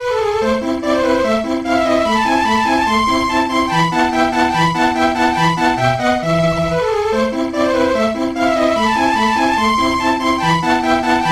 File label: sweep pad